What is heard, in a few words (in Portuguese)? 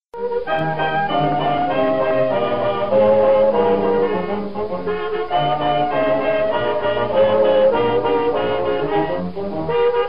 Gaviao folclore